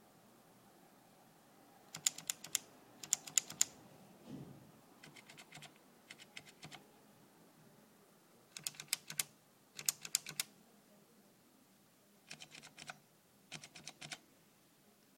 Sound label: click